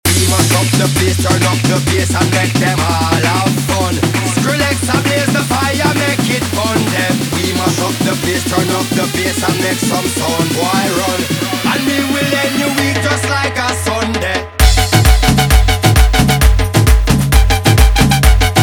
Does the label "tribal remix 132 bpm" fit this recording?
Yes